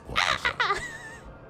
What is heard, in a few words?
"arrhaharg"; noises